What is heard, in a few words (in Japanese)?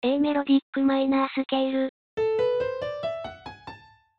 メロディックマイナー